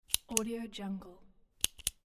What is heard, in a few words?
click pen